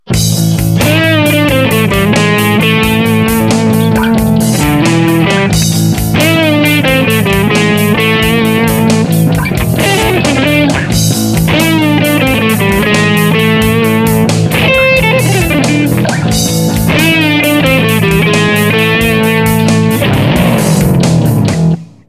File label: blues short riff